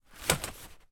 household camping chair in canvas cover fall over onto ground